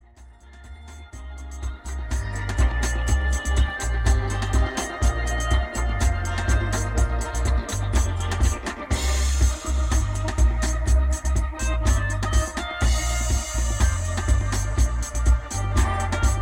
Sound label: Trance Formation Dub